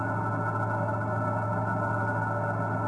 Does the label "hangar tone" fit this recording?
Yes